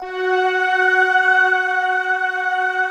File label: future choir, Band; Optical Media International; Sonic Images Library